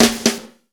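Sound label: fast shuffle, Roland drum beats; Northstar - Drumscapes Roland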